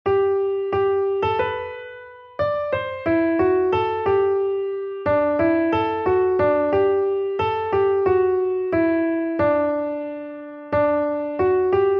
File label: melody; and can it be